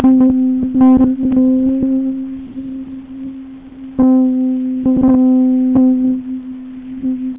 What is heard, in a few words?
seeds, light to sound